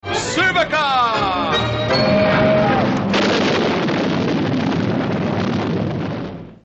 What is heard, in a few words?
Supercar